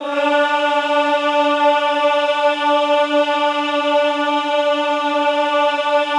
EHH D3, Classical Choir; Best Service; Extended Classical Choir; EHH MIX

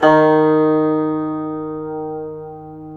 GUZHEN D#3; Spectrasonics; Heart of Asia

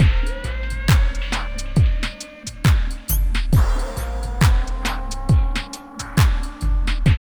LP, Tekno Industrial; East West

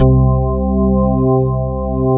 ALL STOP, CHURCHY

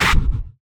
Percussive Hit, contact mic; musicradar; contact mic madness

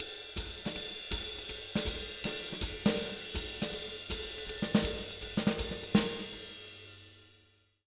160 SWING, Jazz Latin Drumloops

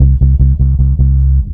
WHA BASS; Big Beat Samples & More

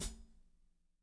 16 HAT, DRY KIT; Spectrasonics; Burning Grooves CD2; DRY KIT 4

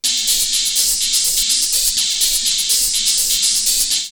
drumloops Rave 124; X-Static Goldmine; 90 s Sample CDs

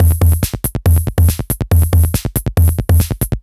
retro drum machine Loops 140bpm; Copicat; SR88

